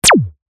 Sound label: lazer blast